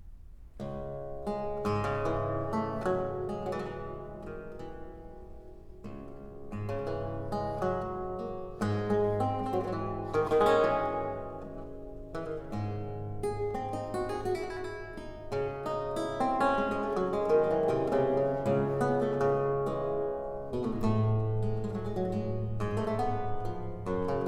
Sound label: Praeludium, Lute Music